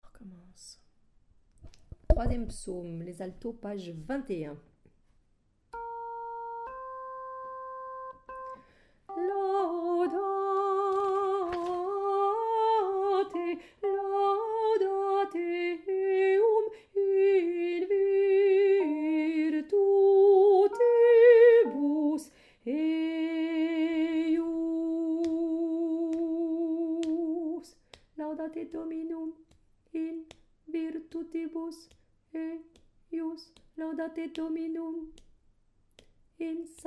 psaume Alto

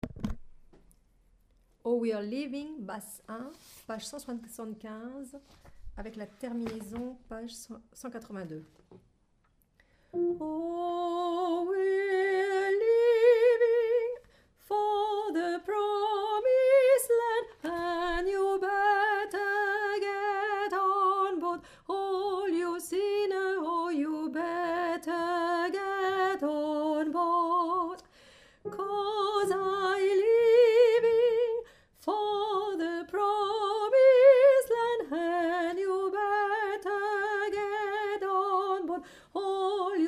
Basse

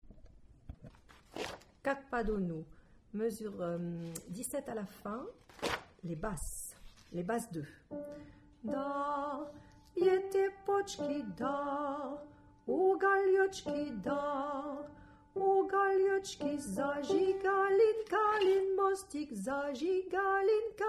Basse

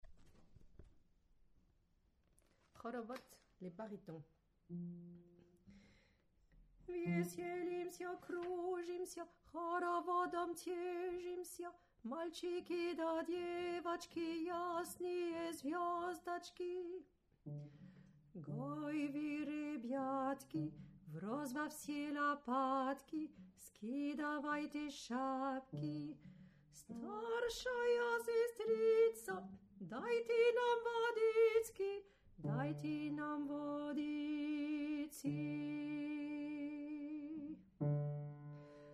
Baryton; Haravod

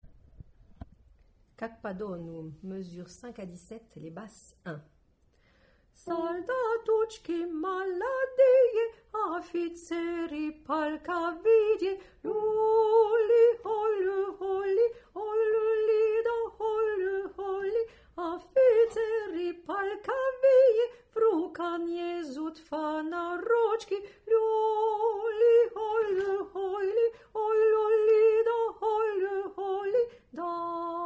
Basse

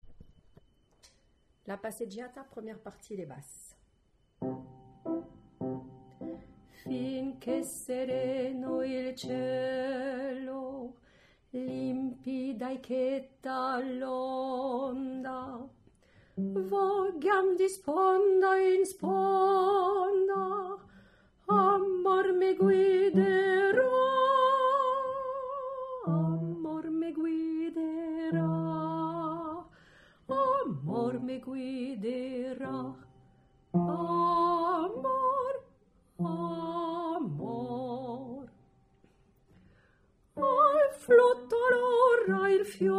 Basse

